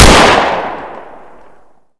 ber fire, weapons; svencoop

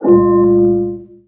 alarm loop; ambience

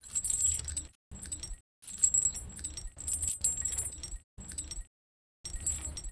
bronze chains; firearms

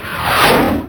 fireball cast, spells; wizardwars

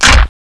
debris cracks window

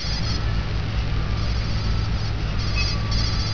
computer, zen

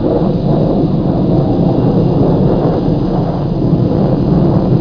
a wing loop; svencoop

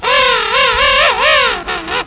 penguin; tfc

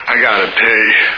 pee

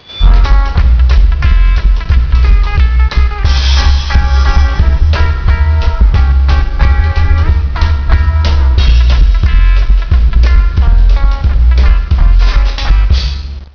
music closing

